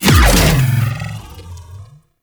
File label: Consume Impact; Warframe; Grendel; Grendel Deluxe